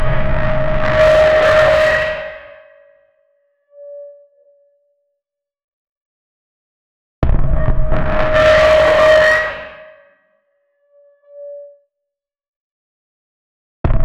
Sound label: collections of satisfying, confirmation